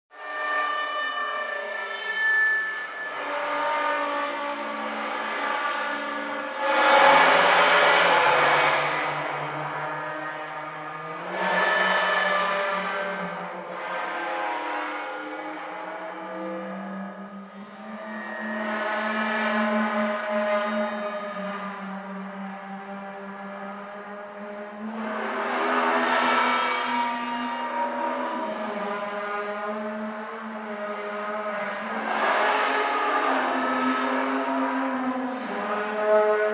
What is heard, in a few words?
Eerie Multi-Pitched Reverberant Metallic Moans; Electronic Sci-fi